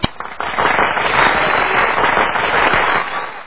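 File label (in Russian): аплодисменты активные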